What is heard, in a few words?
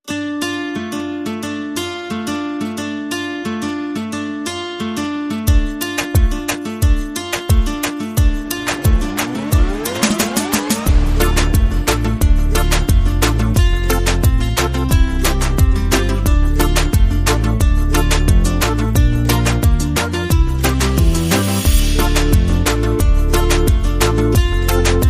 No Vocals